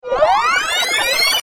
Am thanh quay lai tua lai

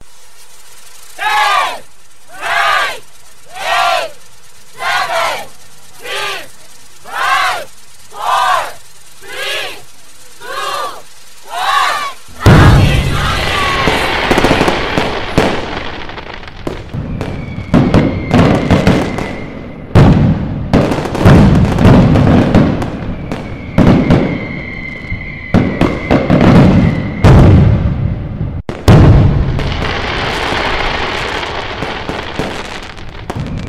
tieng countdown happy new year 10s va ban phao hoa

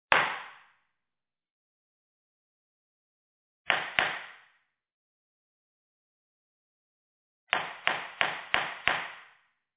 Tieng go bua tham phan toan an

